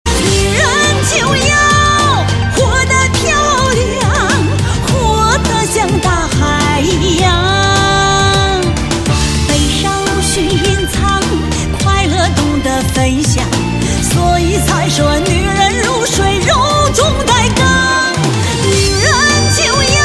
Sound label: chinese ringtone